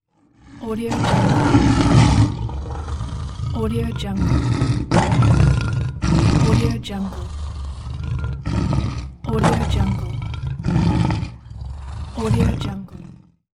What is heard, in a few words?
lion roar